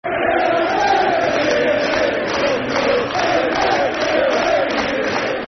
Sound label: Football Chants (Soccer Songs); We Are The Famous Efc; Everton Efc